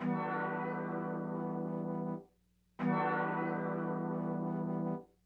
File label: Hip Hop and R&B Drumloops